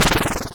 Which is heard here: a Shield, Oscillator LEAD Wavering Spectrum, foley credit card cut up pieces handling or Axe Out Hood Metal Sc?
a Shield